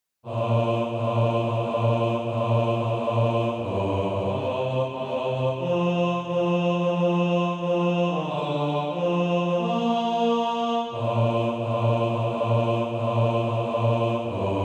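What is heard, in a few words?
bass, SATB Practise Music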